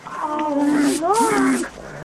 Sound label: grunting persons